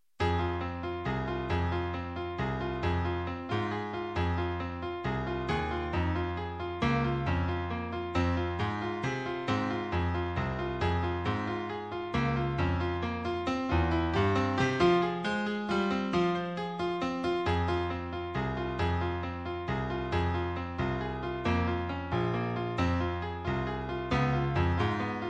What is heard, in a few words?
Clarinet